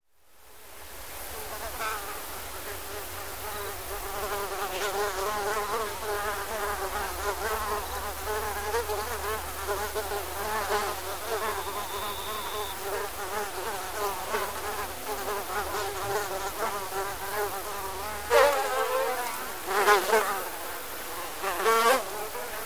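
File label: mosquito sound; komar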